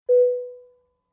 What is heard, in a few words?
Aircraft Bell Announ, warning signals